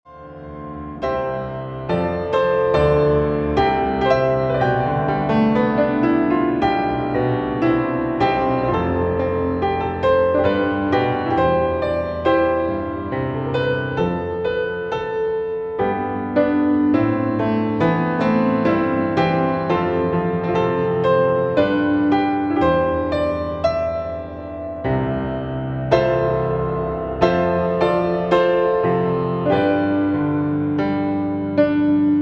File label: sample piano